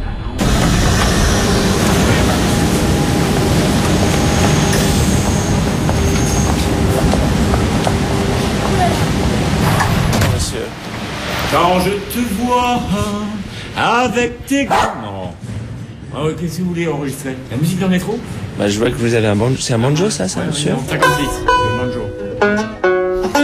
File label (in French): Musiciens du metro joueur de banjo